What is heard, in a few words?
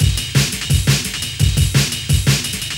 Amen, breaks; Music2000